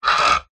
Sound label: industrial lever metal large heavy short fast movement creak; zapsplat